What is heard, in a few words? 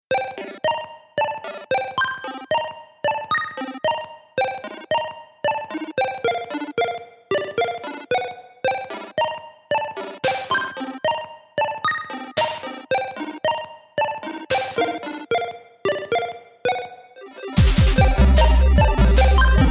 Arpeggio Thingy